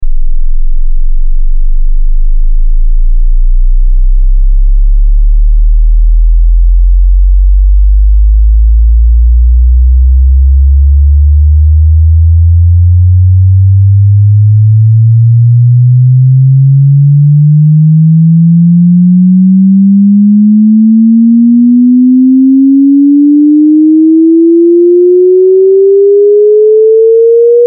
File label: Test Tone Oscillating Sine Ascend 20Hz- 20Khz; 60 Secs 0Db